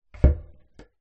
household laundry chute wooden lid close light reverb from chute; zapsplat